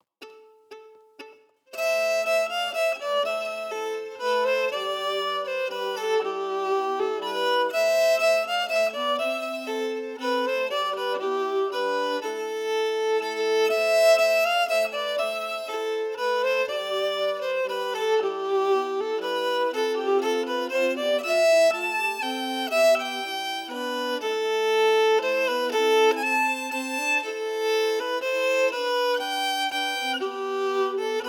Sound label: Bonny At Morn melody emphasized; Peters version